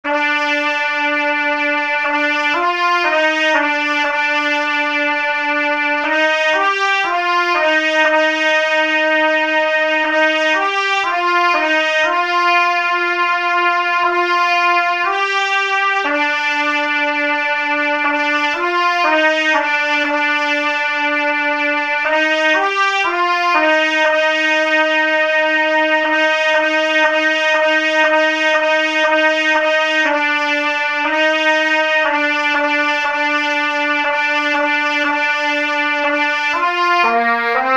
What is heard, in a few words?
trumpet